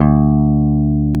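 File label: Bass Electric; CMI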